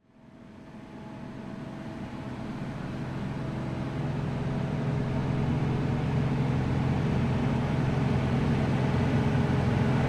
Claas Dominator Combine EXTERIOR Pass By Fast Constant Speed MONO, Combine Harvester; 88 SL Maxi; Dramatic Cat